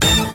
Stabs

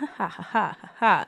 haha haha haha, ooh ahh

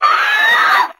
scream 8 bit fighting gal; ooh ahh

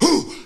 "hoo", voice

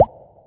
drip

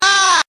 "ah", Voice

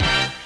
clap ya hands

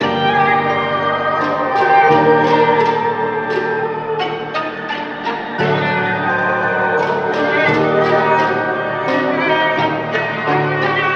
strings worries and concerns; Loops; Sad

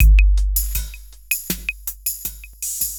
lowkey, Drum loops Electro

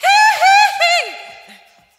hee hee hee, laugh; ooh ahh

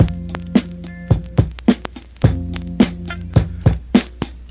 tiny plucks, drum loops, boombap

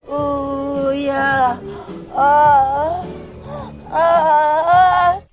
"ooh yeah oh huh", voice

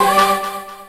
air

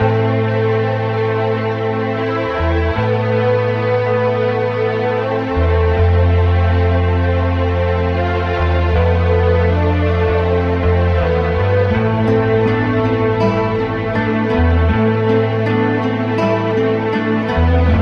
strings guitars, Sad Loops

